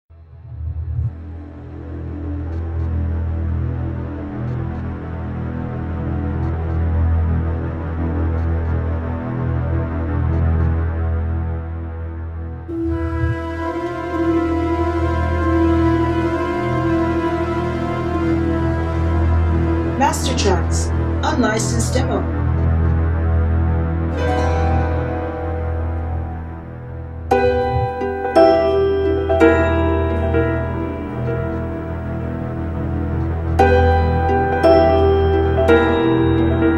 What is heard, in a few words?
Scary Dungeon 86bpm; MASTERTRACKS